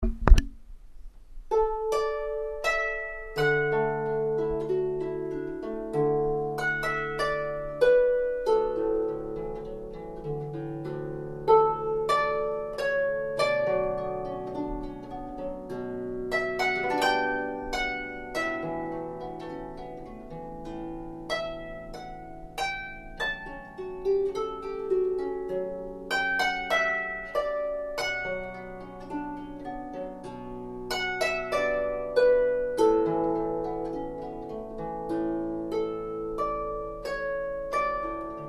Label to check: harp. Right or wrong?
Right